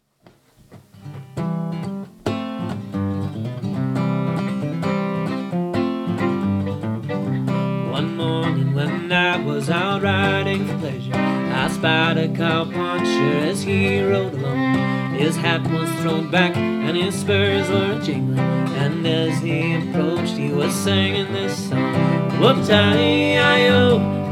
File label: fiddle; Whoopie Tie